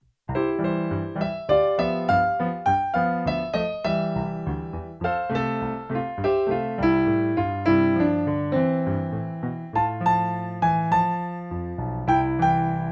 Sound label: roaming drone